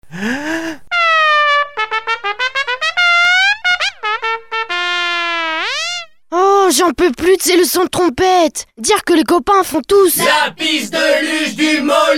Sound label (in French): Trompette; Piste de luge du Moléson